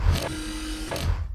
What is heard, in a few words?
Open Window; Net Power 2000 #2